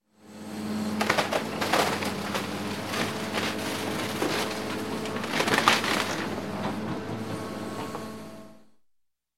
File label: Crushing Wood Bulldozer Crushing Wooden Beams; Crash; Sound Ideas 2000 Series